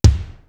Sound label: KAHNBOKICK; East West; Global Sounds